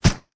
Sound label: Firearm 45 Suppressed; Firearms; Zomboid